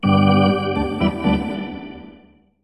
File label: Hockey Organ